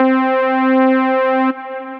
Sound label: Lead, synth lead; Endearing Lead